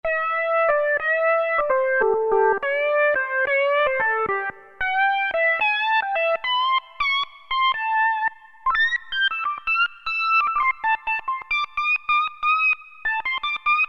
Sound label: Lead, Roland Alpha Juno-2; Factory B